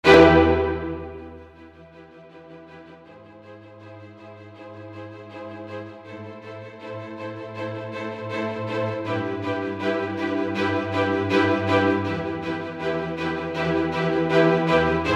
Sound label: String Ensemble; Native Instruments Symphony Series